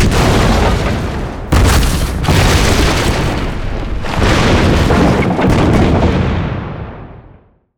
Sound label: Explosion Series